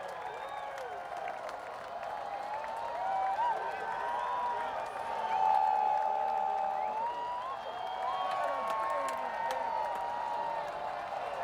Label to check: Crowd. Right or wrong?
Right